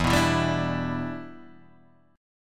akkord em7 boj

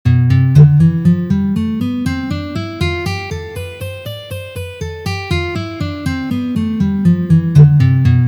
Gamma Do mazhor pozitsiya

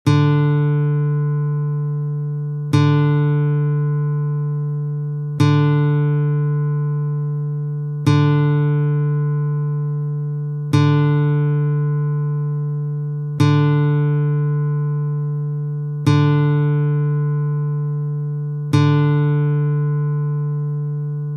struna Zvuki strun klassicheskoj gitary

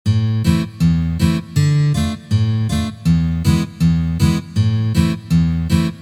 boj na gitare

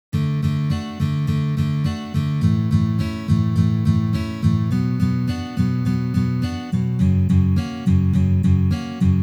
boj na gitare

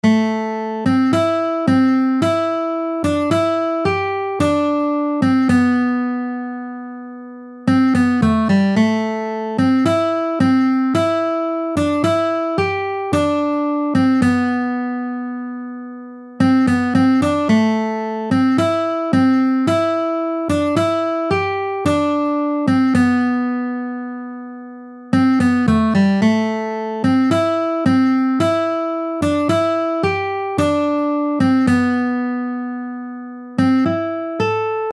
na 1 strune

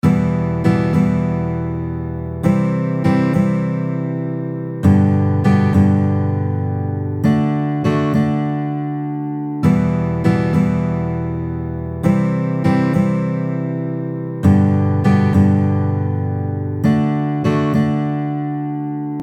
Boj Trojka Balladnyj

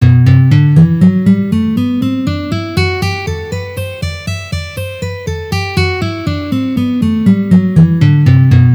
Gamma Sol mazhor Tri noty pozitsiya

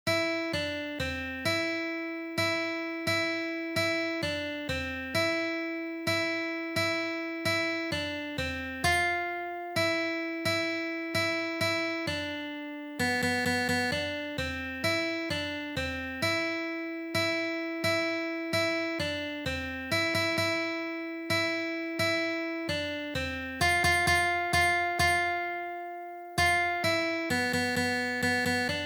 na gitare

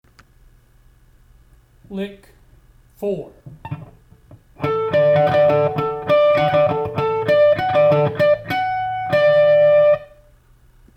Naturalnye flazholety; uprazhneniya

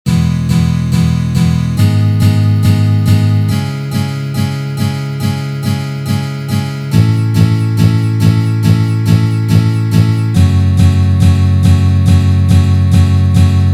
boj na gitare

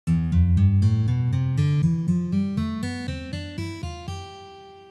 Frigijskij lad

